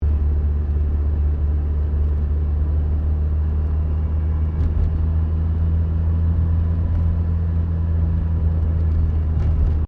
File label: CAR MAZDA RX7 SERIES3 INTERIOR CRUISING 60KPH; Mazda Rx7 1983; Cars (Sound Effects:Vehicles)